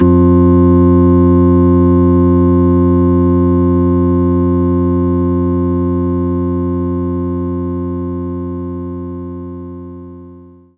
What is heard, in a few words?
Hammond Organ